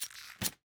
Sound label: aim